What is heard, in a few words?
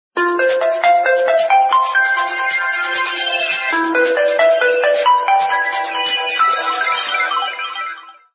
Bell, phone tones; LG GB110